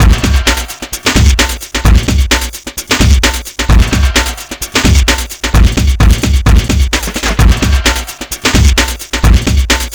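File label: drum n bass, Funky Xtreams; INIS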